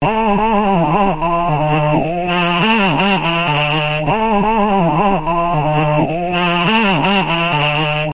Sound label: pygmy flute; WORLD LOOPS